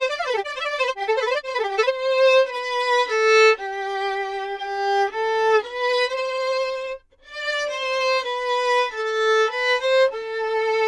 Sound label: Bach Chorale Scorealign resyn